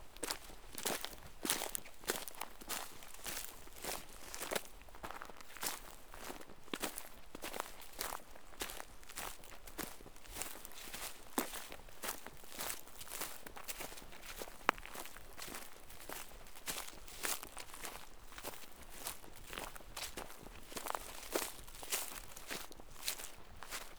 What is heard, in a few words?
footsteps in the woods sound